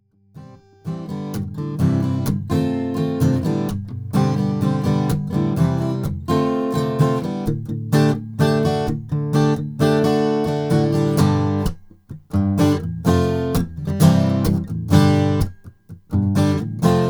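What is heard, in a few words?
vintage revival d 18 torrefied, guitar; greven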